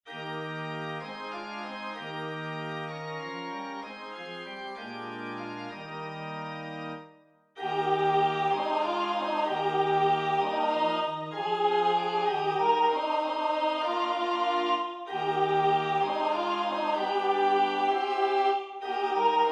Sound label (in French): O salutaris Hostia 1ere voix; Delibes